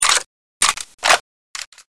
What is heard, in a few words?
gun reload